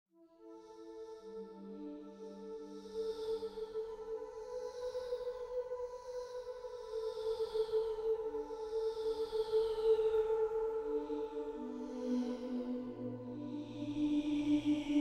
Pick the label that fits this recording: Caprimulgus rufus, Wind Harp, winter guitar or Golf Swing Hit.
Wind Harp